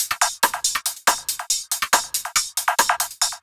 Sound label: Hi Hat and Perc Loop, Dance, Beats